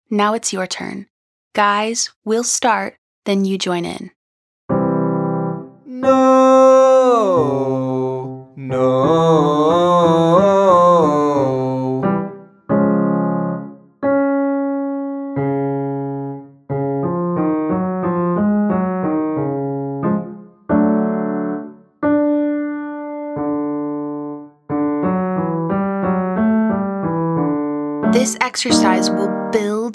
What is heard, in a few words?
No Descending Octave Slide to Bouncy Scale Male